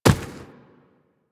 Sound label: Inf Medium Run Dirt, Infested Footsteps; Warframe